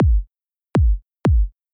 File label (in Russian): kick, Ритмическая фигура бас-бочки 3; Следует обратить внимание, что один удар смещен с сильной